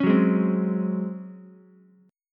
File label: C sharp Major 7th Suspended 4th C sharp Ukulele; strummed; chord; JGuitar